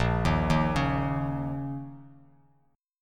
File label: Bb Minor Double Flat 5th, down, Bass Standard; Bbmbb5; arpeggiated; JGuitar